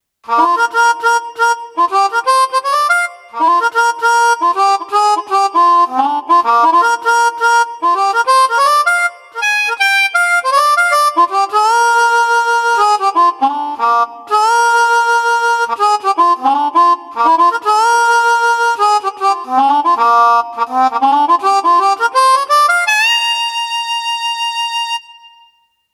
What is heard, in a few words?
blues harp; v-combo vr-09